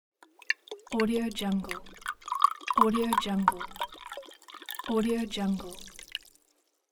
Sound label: pouring water soft